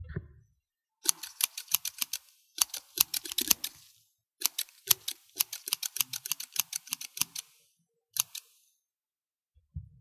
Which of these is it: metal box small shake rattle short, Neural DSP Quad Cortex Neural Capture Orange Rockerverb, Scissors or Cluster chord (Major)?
Scissors